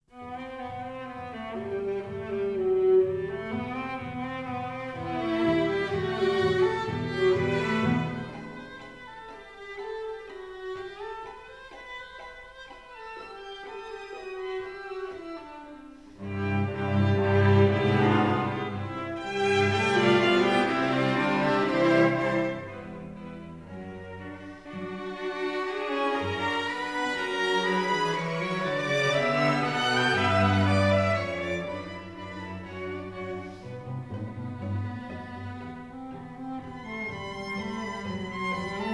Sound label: quintet